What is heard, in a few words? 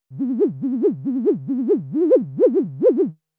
Channel2, loadflow